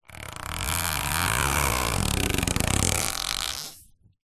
industrial electrical tape strip long pull stretch creak